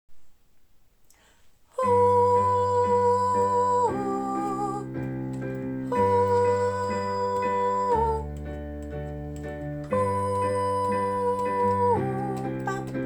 soprano

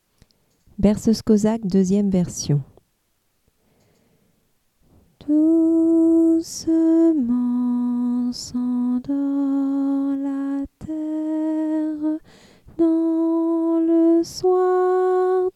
berceuse cosaque version hommes

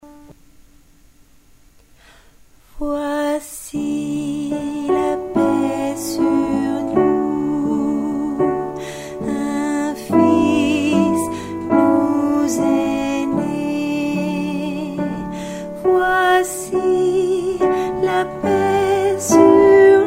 voici la paix sur nous alto

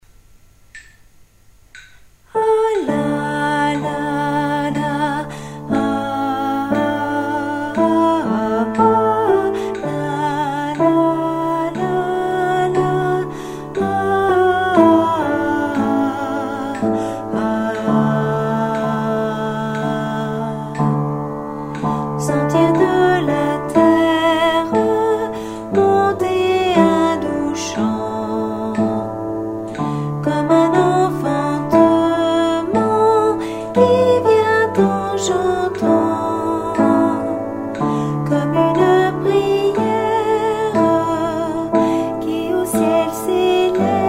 alto tempo 60

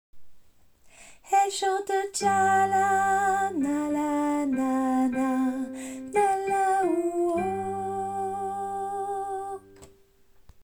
soprano

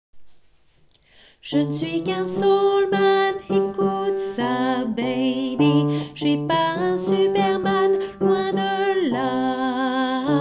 basse

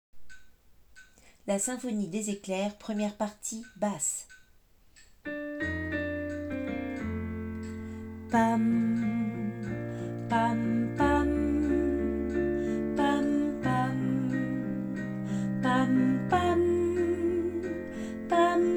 basse

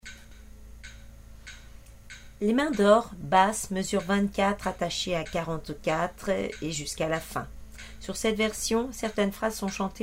basse

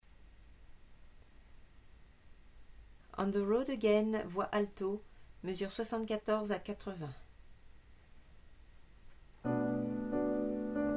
alto

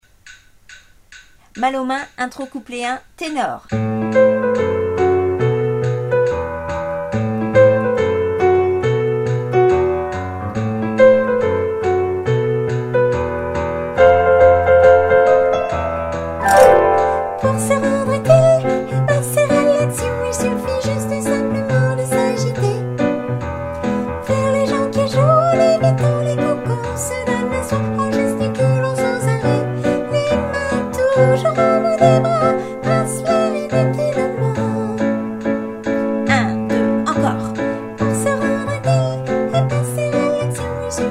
tenor

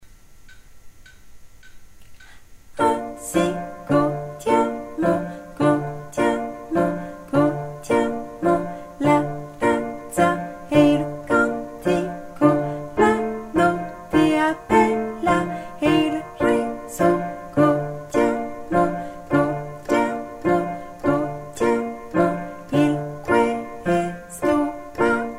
hommes

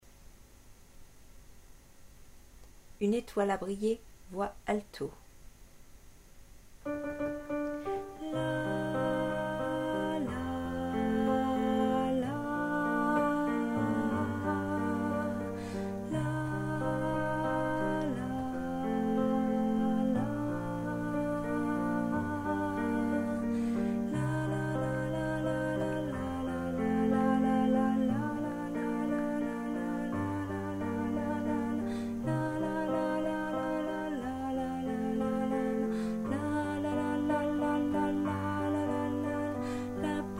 une etoile a brille alto

